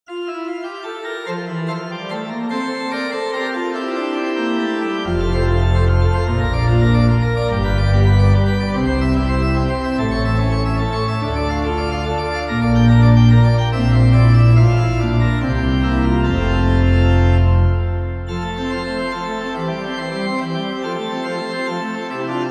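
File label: Bach Prelude in F BWV 556, Pianoteq and Organteq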